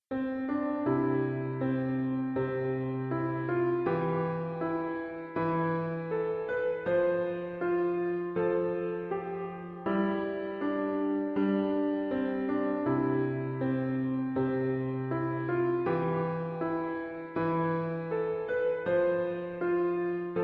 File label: melody